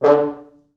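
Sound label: 4 French Horns, Horns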